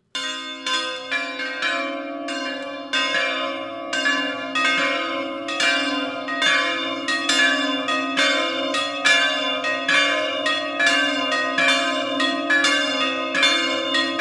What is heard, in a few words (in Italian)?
Fratta, campane